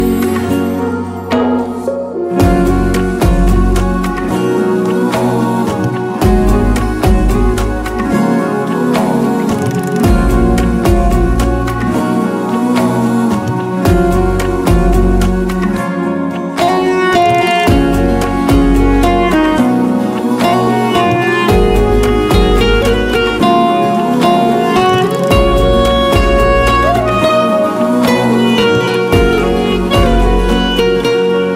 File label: instrumental ringtone